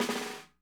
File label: BUZZ, 50S JAZZ; Northstar - New Gold VOL-1